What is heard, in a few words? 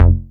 BASS F2; USB Soundscan vol.51 - House Side Of 2 Step; AKAI